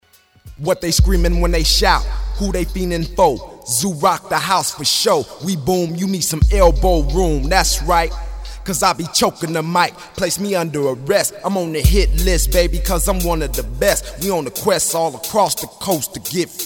Interlude, Rap & Hip Hop

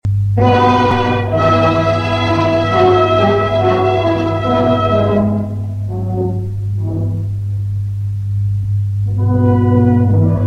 brass, military music in Italy; RISORGIMENTO; WWI

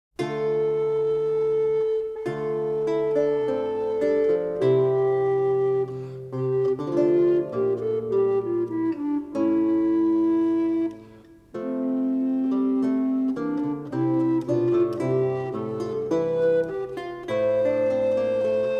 Consort Piece; Consort Piece XX

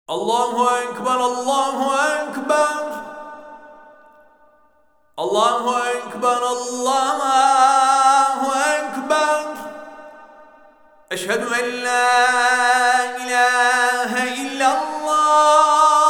qur'ân recitation, istanbul, turkey, ezan

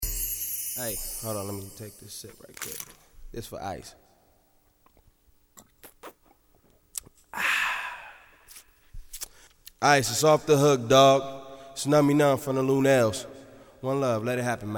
Interlude, Rap & Hip Hop

